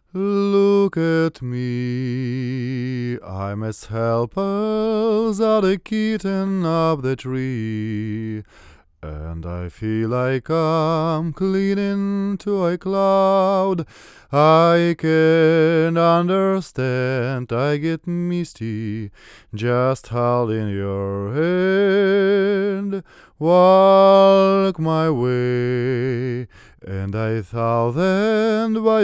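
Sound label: domra, Neumann TLM170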